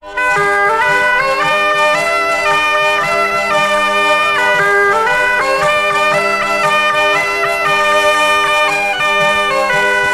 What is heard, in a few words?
alto